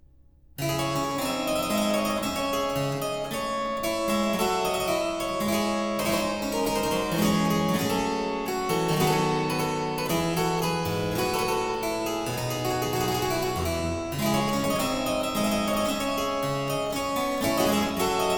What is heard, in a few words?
Suite in D Minor IV. Sarabande, Harpsichord; Buxtehude; Opera Omnia; Harpsichord Works